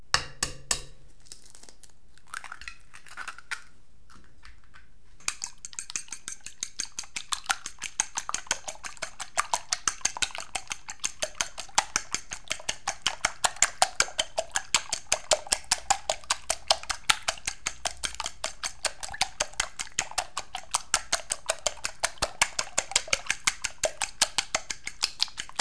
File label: EGG